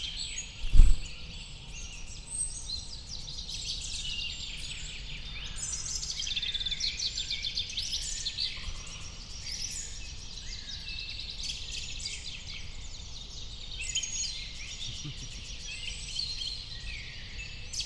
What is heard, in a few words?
Little Bird Flies Close To The Microphone